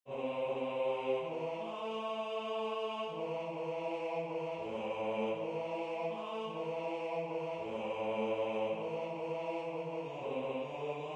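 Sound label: Bass, Religious Folk Songs Of the Negro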